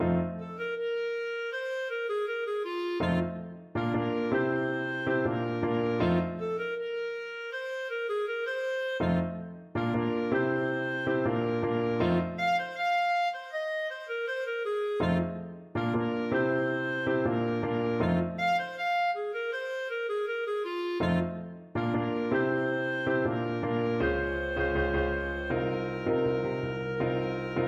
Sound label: happiness is the truth, clarinet